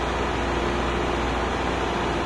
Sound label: dispenser idle; tf